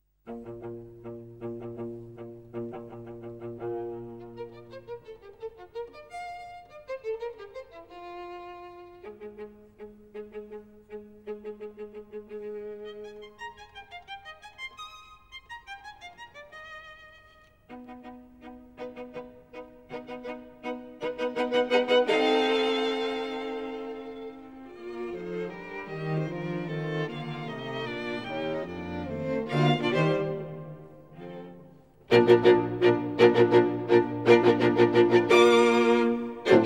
String Quartet in F major

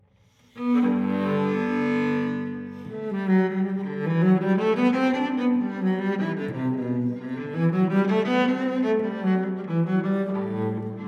Cello Suite No. 1 in G major Allemande; BWV1007; Johann Sebastian Bach

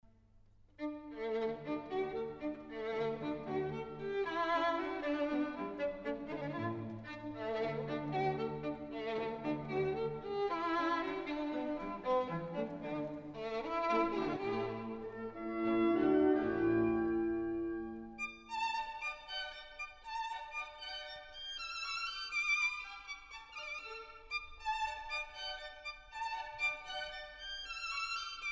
Concerto for violin & orchestra; Kyung-Wha Chung; Bruch

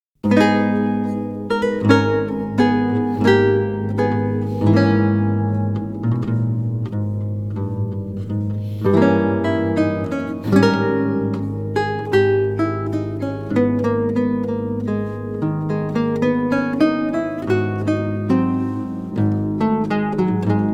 Lute Partita in C Minor, Sarabande; Bach, J S; BWV 997; III. Sarabande

